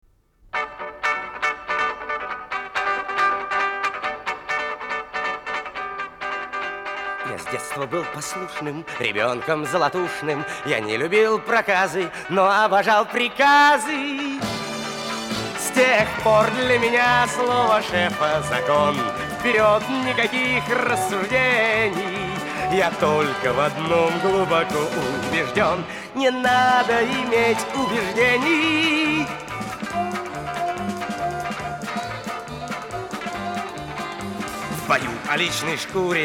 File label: song; Electronic